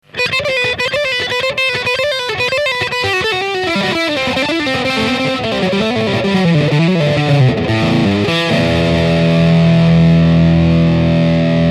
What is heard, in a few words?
Soft Drive Full boost Fulldrive